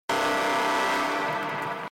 Breach alarm

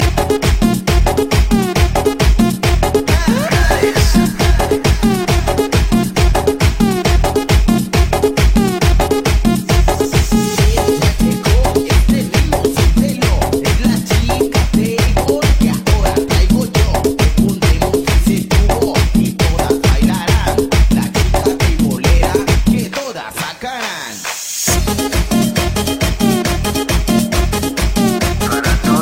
Tribal Mixxs

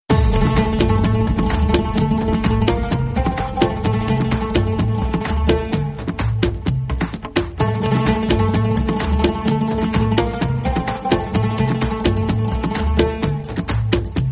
Ring Ton

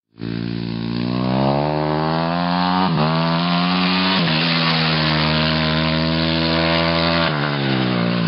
Dirt Bike Yamaha

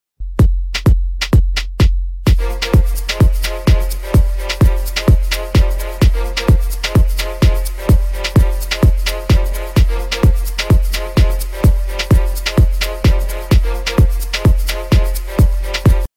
Stick Ringtone

